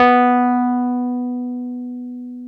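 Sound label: piano, Wurly; Roland LCDP10 Keys of the 60s and 70s 2; Wurly 200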